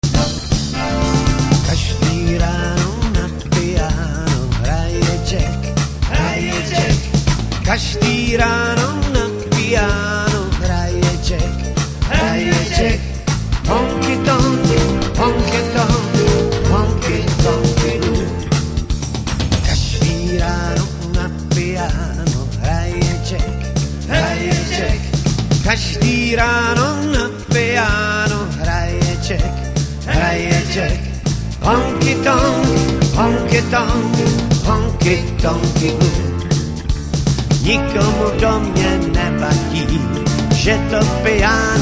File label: blues; Honky tonky